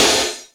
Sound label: IND; Zero G; Man Machine